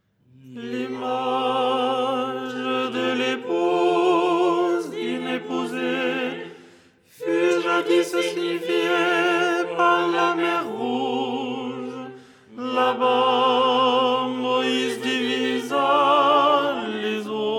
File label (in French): tenor; Théotokion dogmatique; Les 8 tons en français